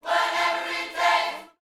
YOUTH CH PHRASE, Vocal Planet Gospel

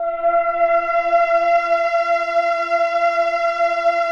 Atmo Pads; Best Service; XX Large

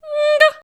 D#5, Voice

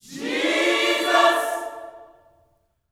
JESUS CHD, Vocal Gospel; Spectrasonics; Vocal Planet Vol.1 Gospel; akai

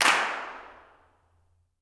CLAPS, Gospel; Spectrasonics; Vocal Planet Vol.1 Gospel; akai

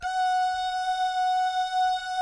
"DOO" GUYS, Vocal Jazz Fx

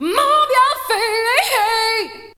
VOC

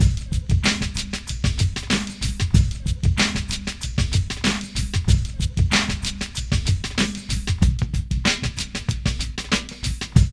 PHAT LOOP; E-Lab; X Static Goldmine 2; akai

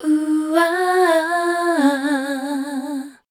MELODIC #D, Voice

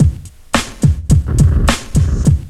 LOOP, DRUM; MLOP DRUM; E-Lab; X Static Goldmine 2; akai

